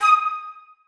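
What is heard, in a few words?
flutes staccato d#3; Sonatina Symphonic Orchestra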